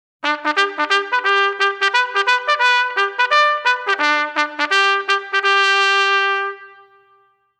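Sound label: Assembly, bugle call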